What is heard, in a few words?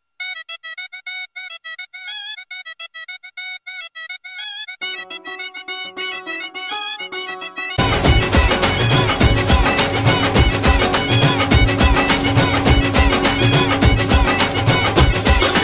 Tune